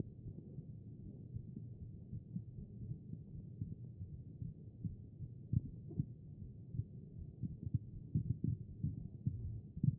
CHEST; B MODE

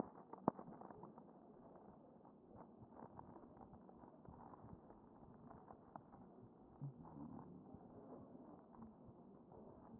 A CHEST

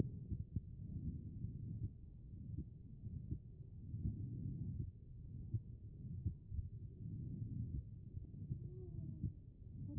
HEART B MODE; cardiac

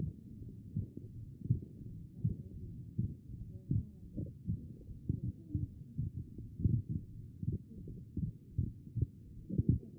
A CHEST; B MODE

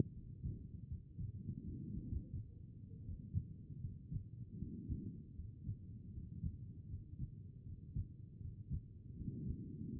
HEART; B MODE

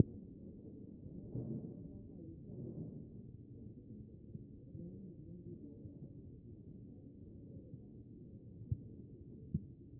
A CHEST; B MODE

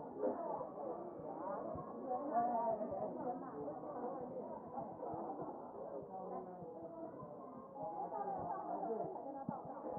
CHEST

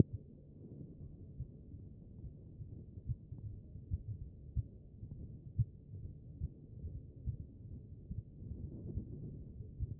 CHEST pos4 B MODE

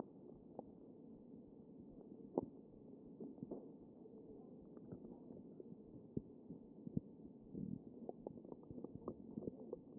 CHEST pos4 D MODE

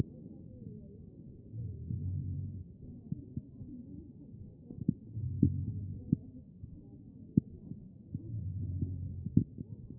CHEST pos4 B MODE

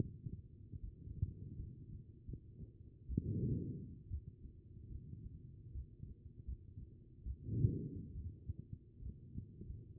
HEART B MODE

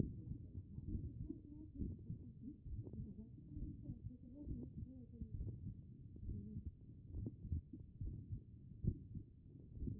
HEART; B MODE

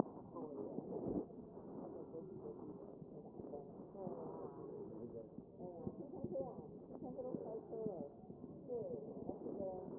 A CHEST

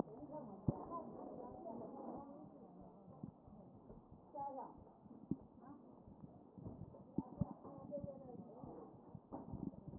A CHEST

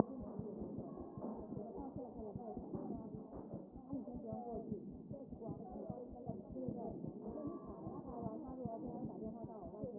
chest

